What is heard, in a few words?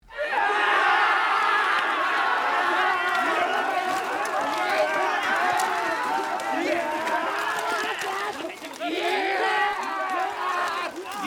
japan war-cry